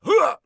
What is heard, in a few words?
hit; Escape from Tarkov